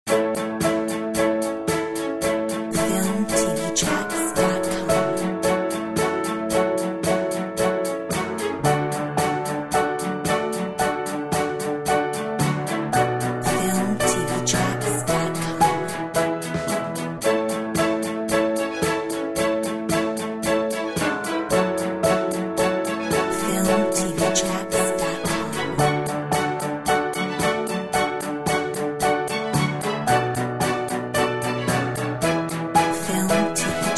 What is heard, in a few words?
Friendly yours